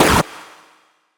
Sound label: Static; Blastwave